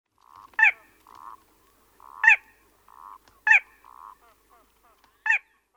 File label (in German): Kleines Sumpfhuhn Porzana parva; Vogelstimmen